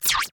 Thin Laser Blast

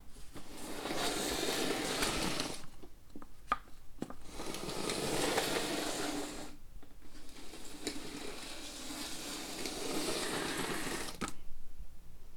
Wooden Box On Wooden Floor

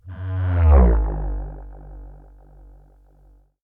Spinning Martian Spaceship Flyby

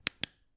Flashlight Click

